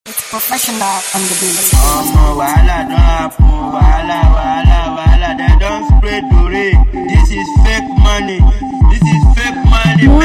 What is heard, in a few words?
Beat